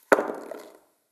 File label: dice; FNV